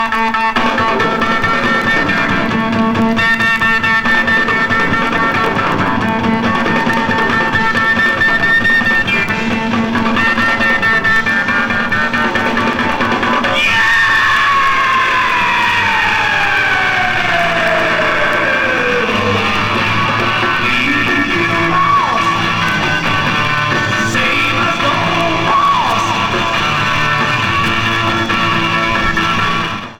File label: Drum Solo; Dayton, OH; August 13, 1971